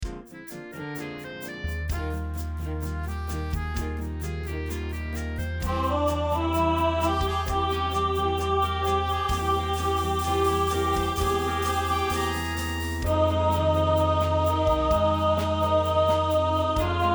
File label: Soprano 2nd Part